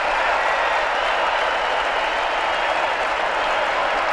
BMX Crowd Cheer Small Loop; Crowds

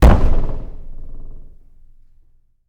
Boxing Ring Bodyfall

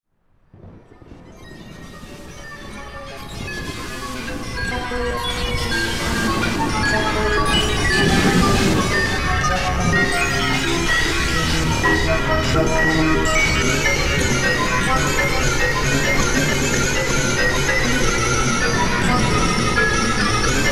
outer space sounds; Halloween Nightmare CD Sound Effects

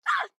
town human road hit; Blind Drive

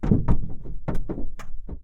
roof body movement; Blind Drive

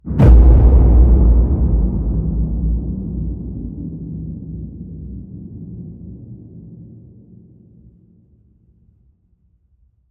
HIT Low, Cinematic Hits Designed

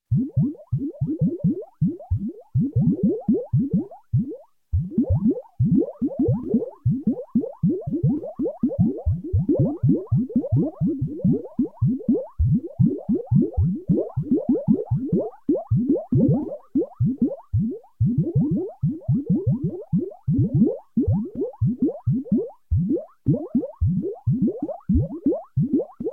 Space Bubbles, Bubbling Medium, Electronic; Sound Ideas 2000 Series

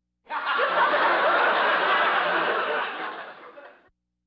Laugh tracks

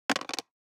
DICE on Plastic, Throw and Roll, Metal, 1 One Die; Samples; ArticulatedSounds; DICE Sfx Party (Extended)

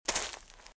Footsteps - Leaves - Walk - Left; RadwinIsland

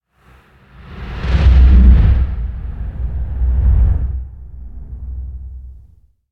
Whoosh Sub And Deep Shaking; Hollywood Edge; sound designer's toolkit v2

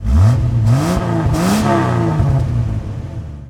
Jeep Wrangler Rev; Trucks

